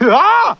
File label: scream